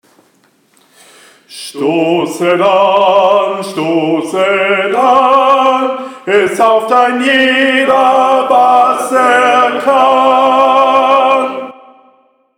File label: TENOR; Gesang; Stosset an